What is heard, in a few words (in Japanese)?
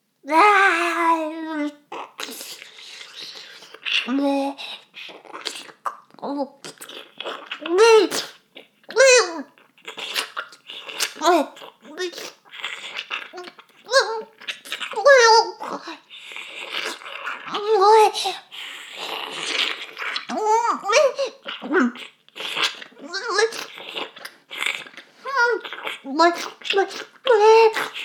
フェラ音声; 少佐殿; 牧場戦腺